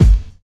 kick drum sample g key, bass drum sample tuned to the G note. Loudest frequency: 159Hz